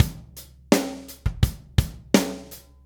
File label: Drums, Rock